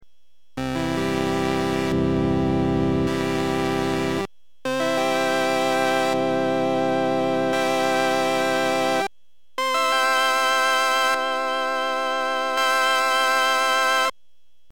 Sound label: Full Flute, Rheem combo organ